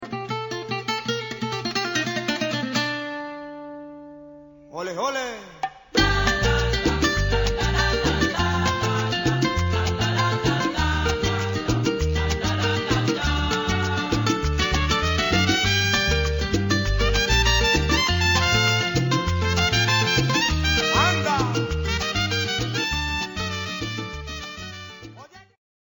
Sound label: salsa